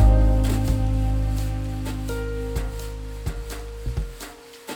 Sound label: Nice Chord; BB 2HTC Samples Vol 4; Pads